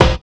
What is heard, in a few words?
collision; Spectrasonics; Metamorphosis